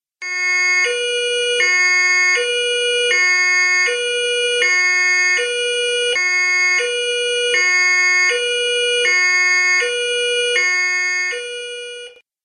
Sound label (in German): Sirene Polizei Land